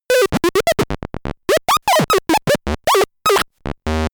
talking arcade

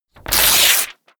tear

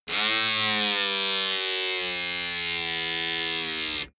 servo

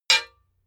hammer hit stone short

